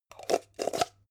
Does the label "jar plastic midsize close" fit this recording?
Yes